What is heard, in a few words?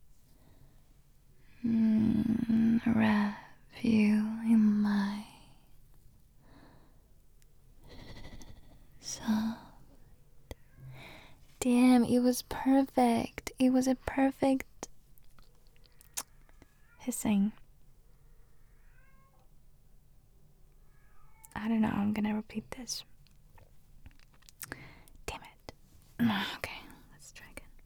Perfect Hissing